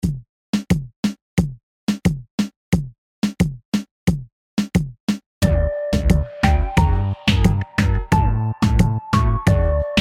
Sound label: PSYCHEDELIC REGGAETON